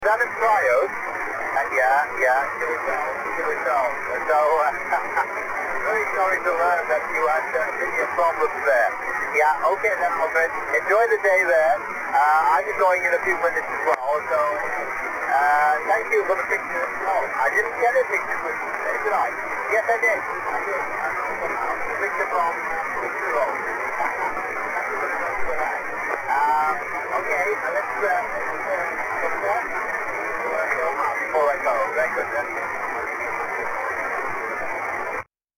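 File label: 20 metre noise reduction spacial diversity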